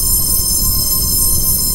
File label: hi phonic; Best Service Dream Experience; FILM-TOOLS